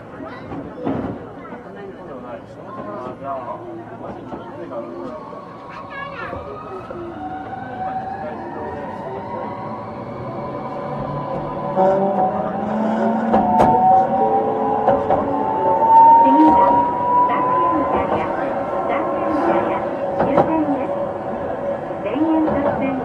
tokyu 300, vvvf mitsubishi

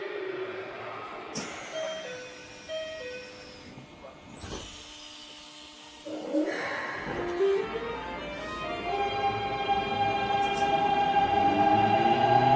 keikyu 1000, siemens vvvf

